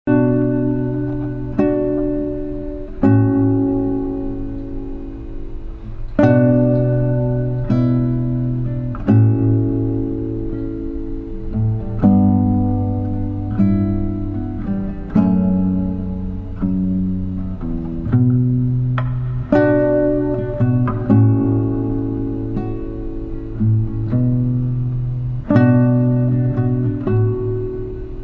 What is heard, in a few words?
Romantic Guitar